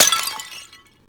shatter

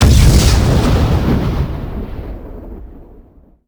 boost; sonic

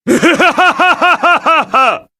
crazy laugh; gundam

